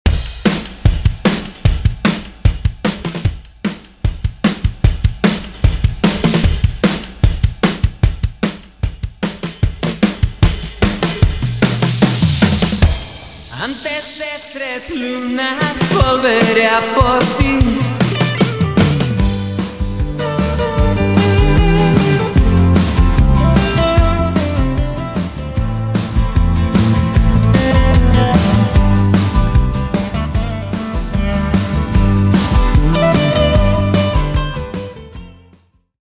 150 BPM remaster intro

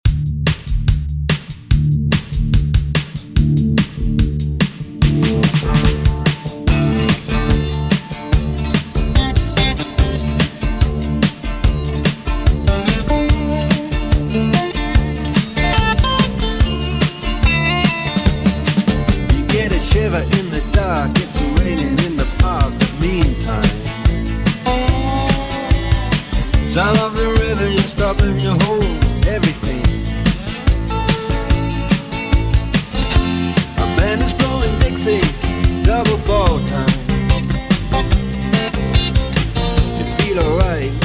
145 bpm

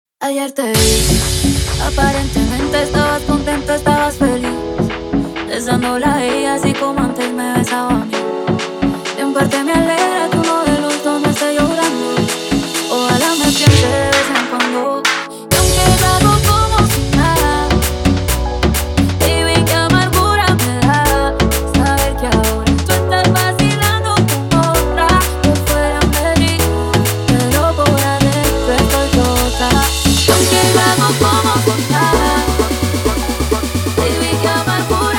intro outro 130 bpm

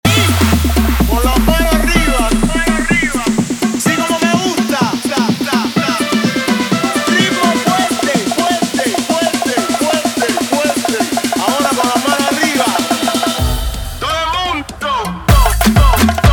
afro remix 126 bpm

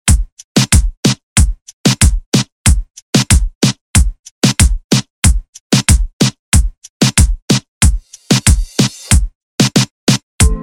Intro 93 BPM

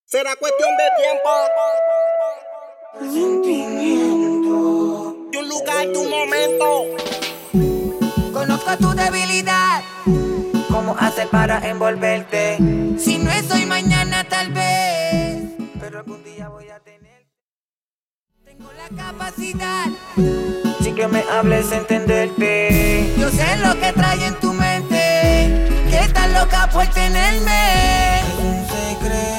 Acapella In Out 95 BPM

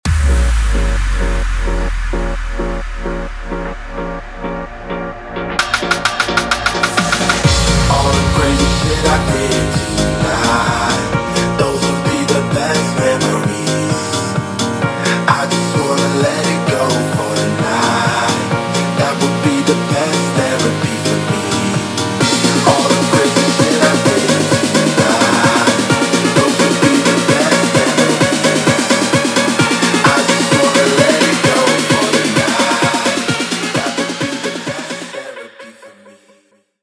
intro outro 130 bpm

